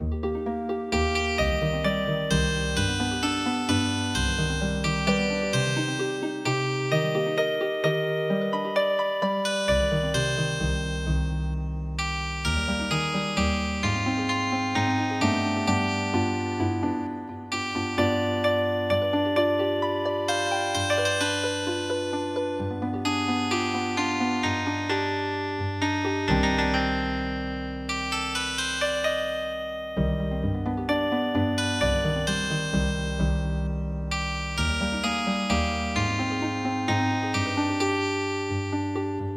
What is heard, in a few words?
Healing Harp, traditional